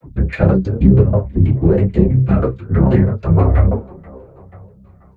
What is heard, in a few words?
waking up